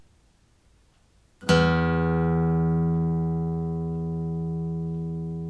6th String E, Guitar Chord

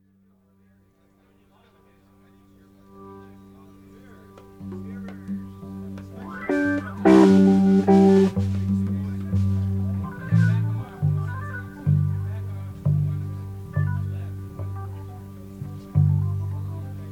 tuning

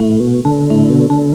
casio guitar

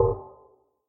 bell worm

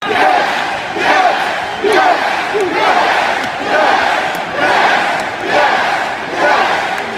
crowd chanting "YES"

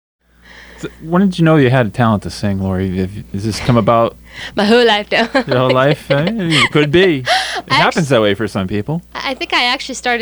Audience Participation

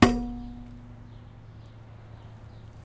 single hit; Listen01 Sample Pack

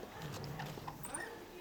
squeeker; Cumbria Remixed Sample Pack

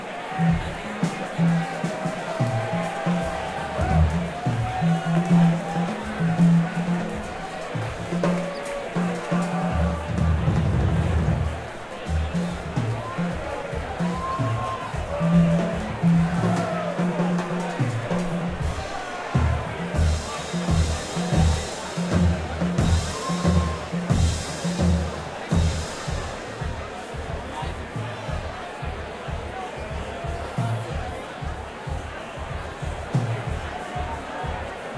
Drums; akg ck63; mixpre6

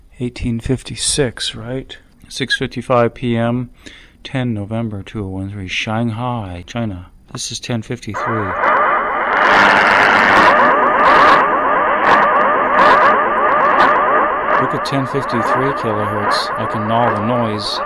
Korean Jammers; rec in Shanghai China; Mediumwave am Broadcast-band Dx; Hawaii

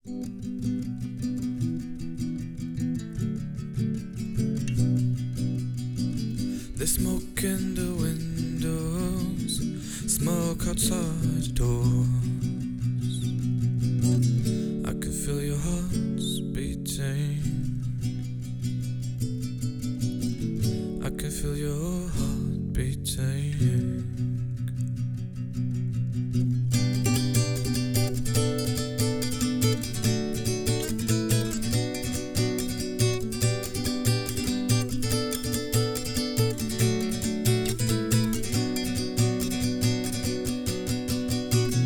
Heartbeat; Gobi Bear

